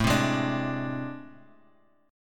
A Minor Major 7th Double Flat 5th, down, Guitar, Open F; AmM7bb5; strummed